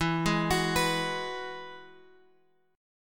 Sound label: E Major Add 9th, Guitar Open F; arpeggiated